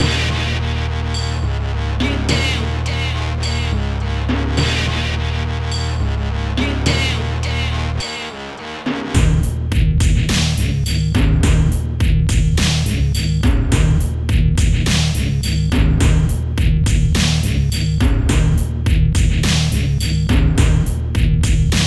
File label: scratch